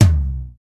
hi tom one shot c sharp key, tom one shot tuned to the C# note. Loudest frequency: 522Hz